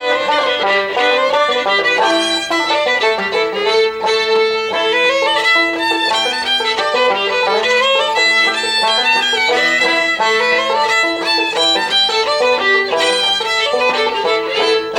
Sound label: British Field March, musicbox